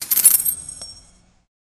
purchase sound a medieval, chest

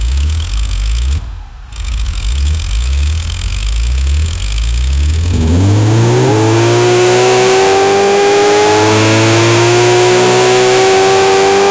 opel corsa opc with a, low rpm

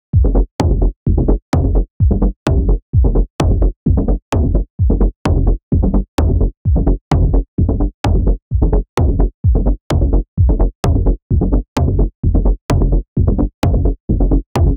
deep house sound effect, beat

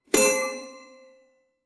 short satisfying success; crafting

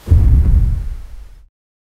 soft poof sound like a, airy

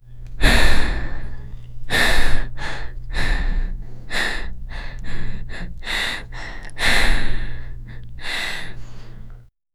18 years old handsome you, breathing

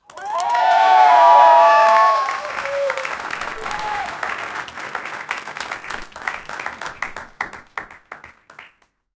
studio audience erupts in, applause

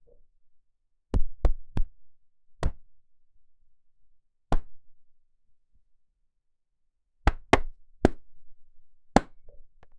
dumbbell hit floor

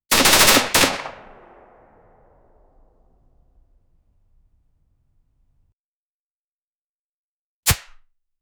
realistic 10mm pistol gun

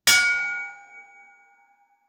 the echoing sound of a metal pipe being struck; impact

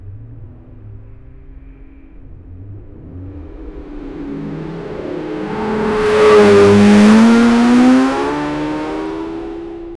bmw m3; sound effect

